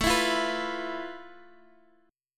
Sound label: F Major Sharp 11th A